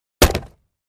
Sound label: Axe Fire Log, axe hits